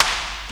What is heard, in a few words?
STEP REV, drums; Crypton - DrumBase5000; CD DRUMS #2